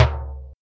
reverb tom single hit c sharp key, tom drum one shot tuned to the C# note